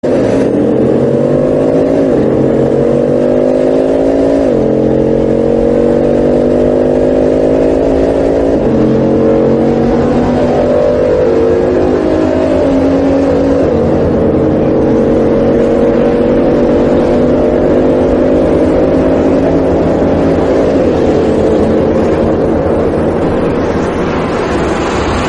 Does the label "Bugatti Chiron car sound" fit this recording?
Yes